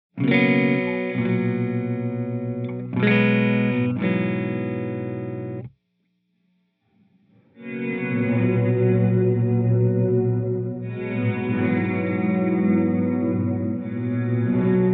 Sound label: Ambient Trigger, reverb